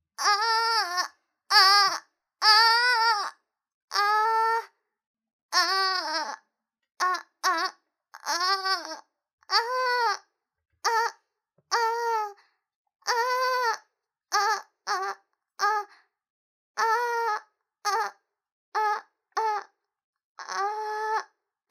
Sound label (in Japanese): ノーマルマイク あーーーーーーー